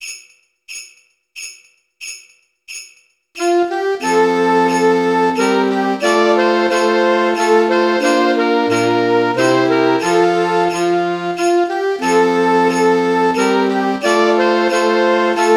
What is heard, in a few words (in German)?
Weisst du, wieviel Sternlein stehen? sax Play